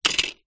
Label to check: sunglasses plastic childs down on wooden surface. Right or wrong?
Right